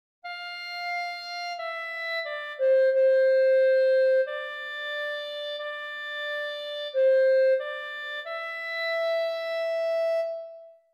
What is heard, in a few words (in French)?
CLARINETTE; Tu Es Pretre a Jamais